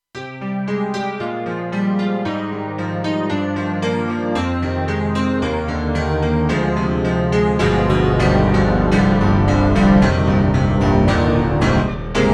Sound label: Korg TR-Rack Super Grand